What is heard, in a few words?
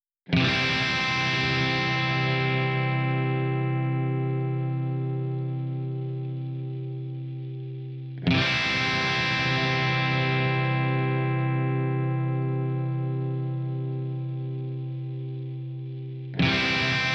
Noise Check Before